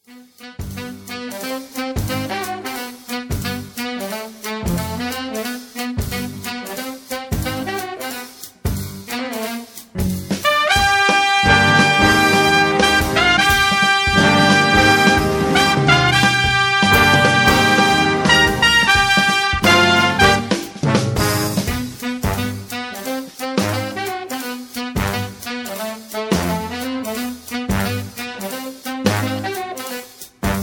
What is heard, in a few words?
Song Flute